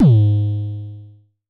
Dist Kicks G#2, Vermona Kick Lancet, Distortion Kicks; Drums; LookIMadeAThing Kicks